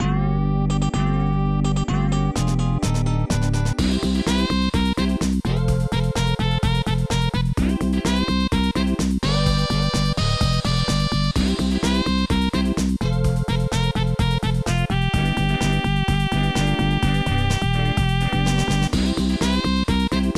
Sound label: Lose; Super Famista 3; SNES